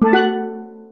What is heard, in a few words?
jingles STEEL; Kenney's Sound Pack; Music Jingles